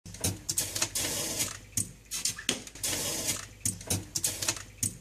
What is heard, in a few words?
bubbling hissing, refrigerator; Samsung